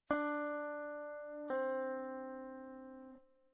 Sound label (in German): pull off